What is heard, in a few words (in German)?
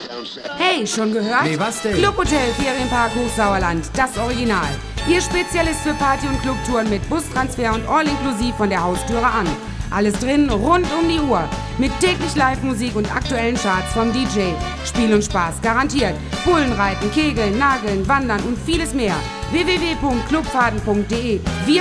Werbejingle clubhotel